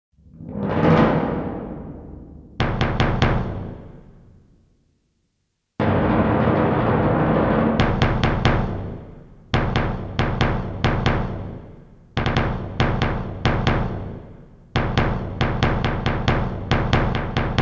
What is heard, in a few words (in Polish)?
Wielki bęben; Przedszkole nr 1 Tuchola